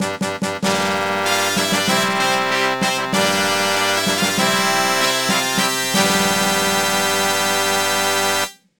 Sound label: Fanfare; Tadashii Nihongo DS